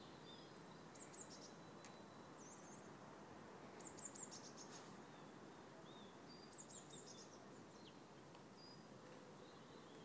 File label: birdsong in malvern garden including a distant clock striking twelve